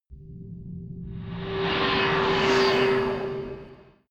space passing fighting racer

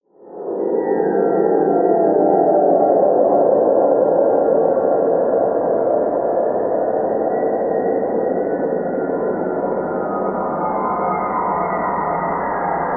abyss pad

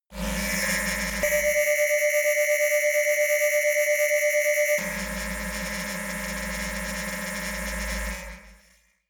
scanner

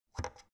oven knob turn soft

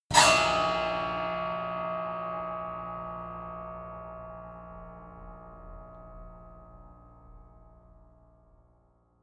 piano squeal

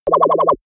toy laser

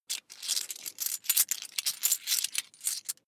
coins big clatter fast long

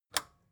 record player power push on

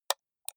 computer mouse left click single slow hard

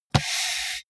computer mouse draw drop

